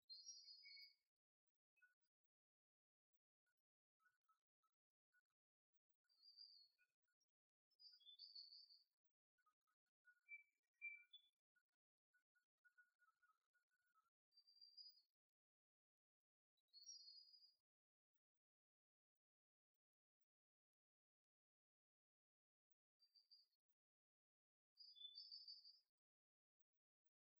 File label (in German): Lichtung Soundaufnahme Botanischer Garten Marburg